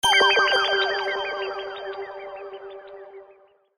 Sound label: Jingle Title; pokemon smile 2020 ios